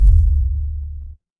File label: retro game coin